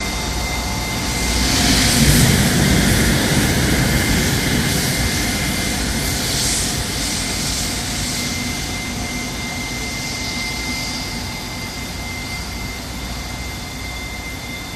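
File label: Petroleum Plant Whine, factory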